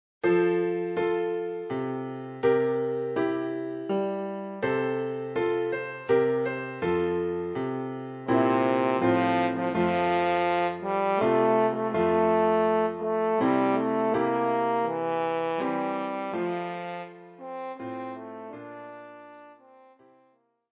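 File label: horn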